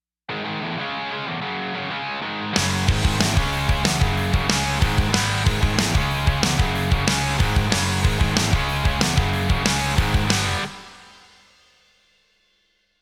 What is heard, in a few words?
tape eq on